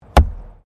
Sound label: Archery Foam Target Impact; Various archery sounds; Miscellaneous Sports